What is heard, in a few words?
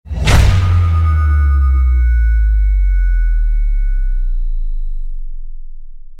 deep bass